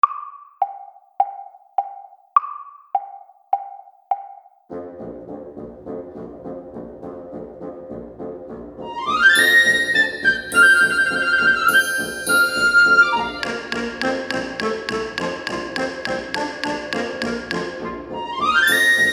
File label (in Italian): danza base con strumenti